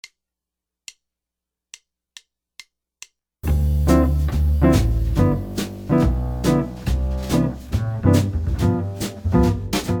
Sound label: guitar turnarounds